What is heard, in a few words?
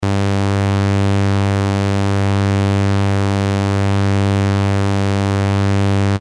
saw